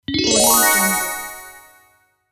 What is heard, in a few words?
magic fairy spell